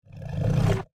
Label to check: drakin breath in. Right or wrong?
Right